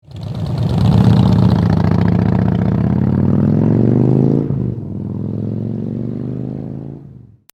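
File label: TAKEOFF, motorcycle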